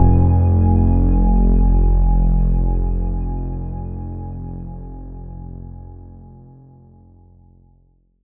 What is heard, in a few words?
bass F1, Oberheim OB-Xa